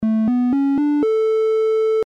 triangle

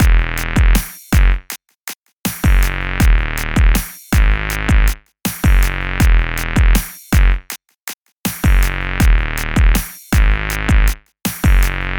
bass

